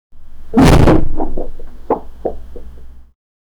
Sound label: the sound of a person falling followed by a comedic thud